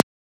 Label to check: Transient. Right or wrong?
Right